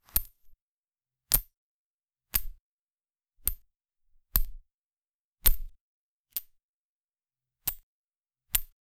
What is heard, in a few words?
a short clean game sound, shooter